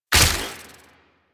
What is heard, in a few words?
Shot, Weapons; Warframe; Infested; Derelict Cernos Prime